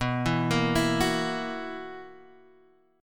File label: B Major 7th Suspended 4th B down Guitar Half Step Down